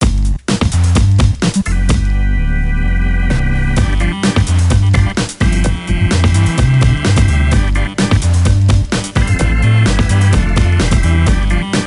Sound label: Puzzle Music; Daring Game for Girls; NDS